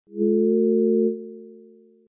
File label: Synthetic sound samples, whistle synthesis; There is a gradual turn on until time 0.15 sec and turn off at 1.0 sec; Synthetic renditions